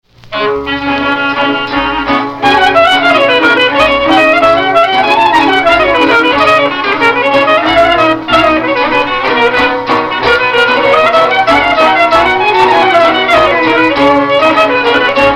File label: Tsamikos